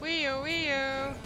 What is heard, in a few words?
weeoo